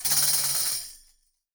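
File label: shake, right, Percussion; Miroslav Vitous - Percussion & Harp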